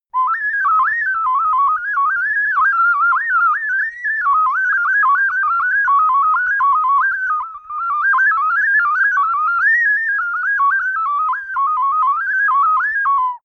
ocarina randomness long

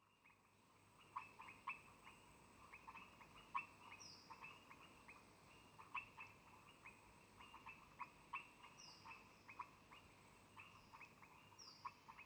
insects jungle atmo

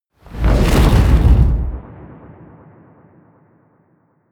whoosh flutter

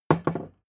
hammer wood bounce

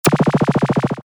laser zaps

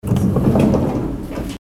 train door sliding opening cabin inside

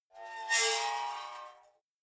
metal scrape rich short

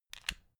stick lighter extinguish flame

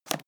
leather latch open

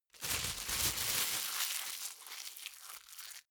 plastic bag rumble

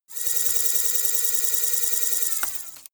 servo move high

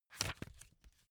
magazine flip back fast

